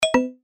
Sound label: Voice Search Off; MessageDisplayKit